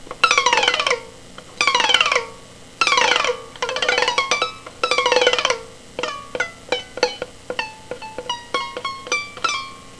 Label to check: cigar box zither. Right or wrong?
Right